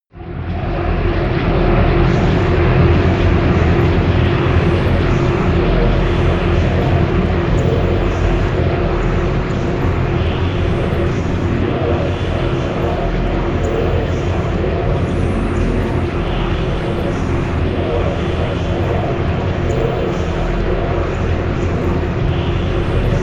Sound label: drone noise